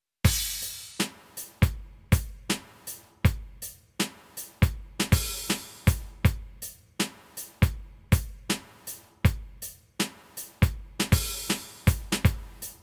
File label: The journeys end drums